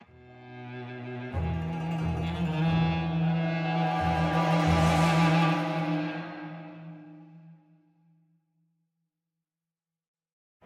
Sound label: Action 90bpm Intro; project zomboid; They Are Close